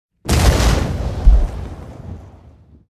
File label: Massive explosion close perspective